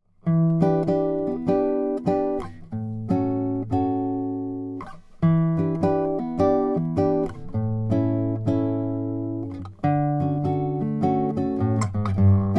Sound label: lowden s25j indian rosewood